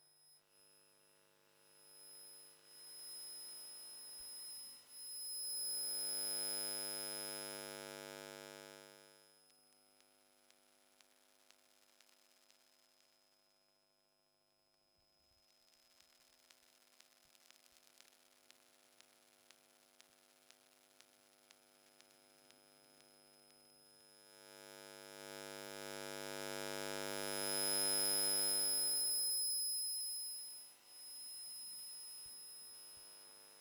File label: Electromagnetic Field, Electro; electric fields